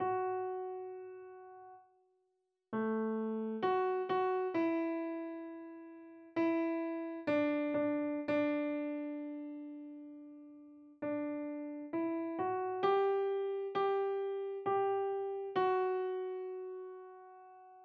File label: Piano Soprano